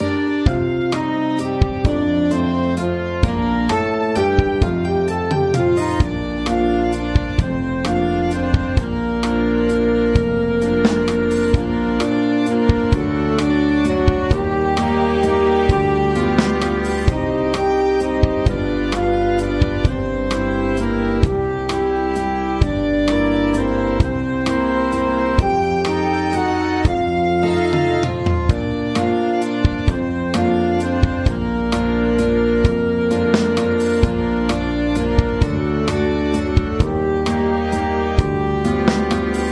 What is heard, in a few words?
kissing her now, big band